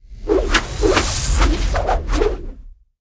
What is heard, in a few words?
staff big slash; weapons; arkane